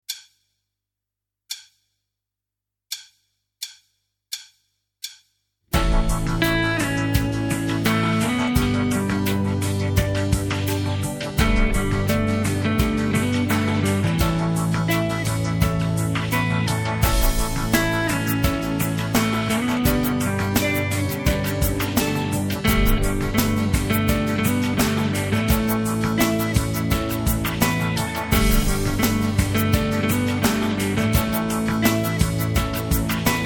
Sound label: D NO BASS